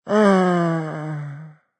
Moan Old Man, Male moan, older man, sigh, (human voice); Groaning